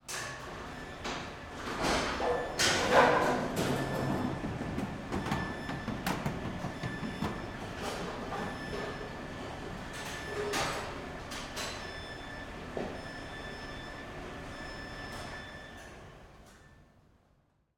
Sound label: Bowling Machinery Pins Resetting Side Perspective; Soundopolis